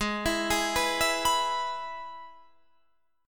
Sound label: Ab Minor Sharp 5th Ab down Guitar Standard; Abm#5 arpeggiated; JGuitar